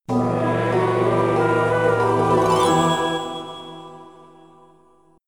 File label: (Jingle) Obtaining the Mask; rayman 2 remastered soundtrack